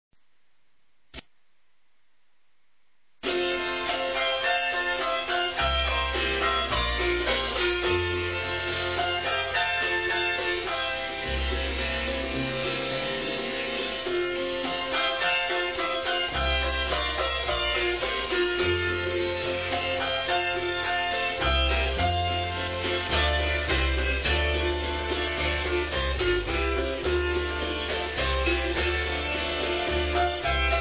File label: autoharp on mountain music; country blues; CD country blues vol.2